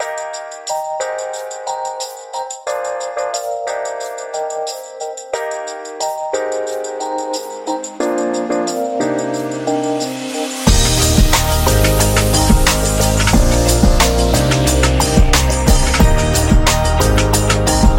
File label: Boho